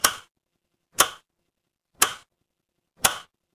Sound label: mechanical switch